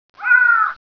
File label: ambi, jungle; gearbox